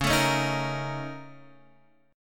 Bb Minor Major 11th Db

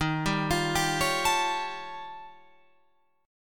D sharp 9th Flat 5th D sharp, Guitar Open F; D#9b5; arpeggiated

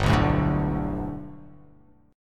G Major Add 9th chord

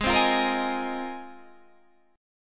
G sharp 6th Add 9th G sharp; G#6add9; strummed

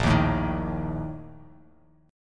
A sharp Major 7th Suspended 4th, Bass Standard; strummed

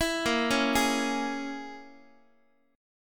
Bb Minor 7th Flat 5th, Guitar; Bbm7b5 arpeggiated

